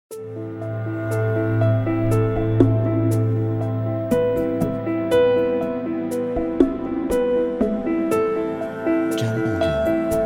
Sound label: Atmosphere Landscapes